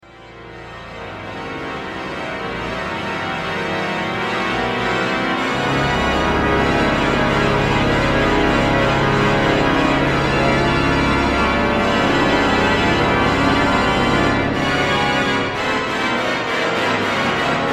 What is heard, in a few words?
Improv second part